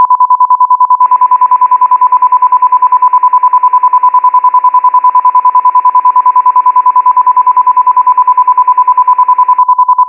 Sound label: CB Radio Two Level Tone